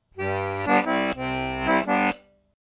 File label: And phrase three; Back Door